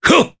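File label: Vox Attack Japanese; Nicx; kings raid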